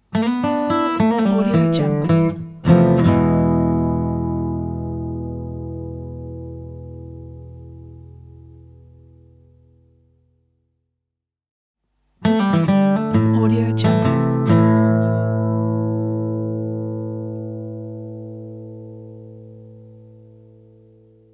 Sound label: acoustic blues idents pack; crossgateproductions